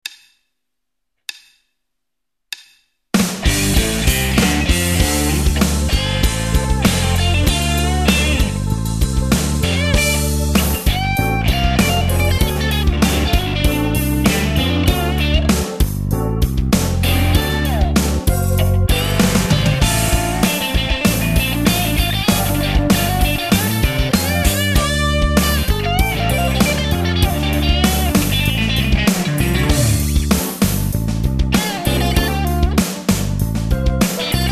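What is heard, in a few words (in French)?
Style blues & blues-rock, stratocaster roadhouse; guitare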